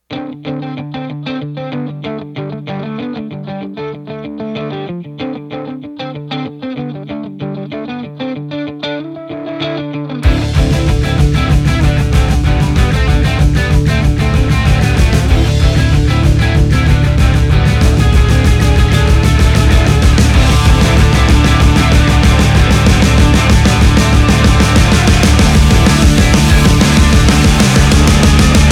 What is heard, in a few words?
Binary Amp with Binary Cab; HOTONE